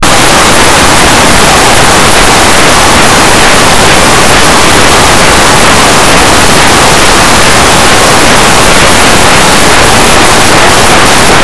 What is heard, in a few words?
dog pant; Blue Heat